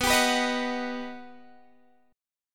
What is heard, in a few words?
G Augmented Major 7th